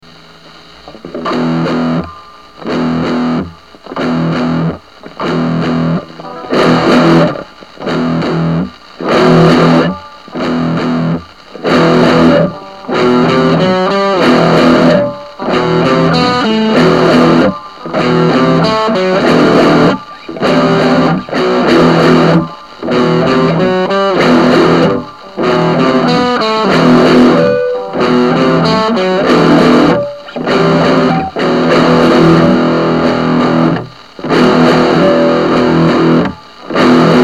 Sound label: Guitar Riff